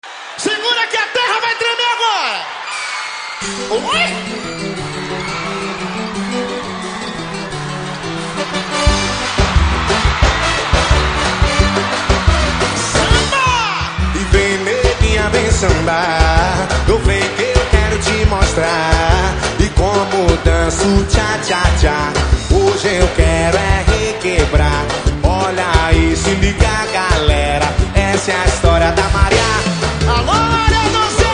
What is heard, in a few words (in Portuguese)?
samba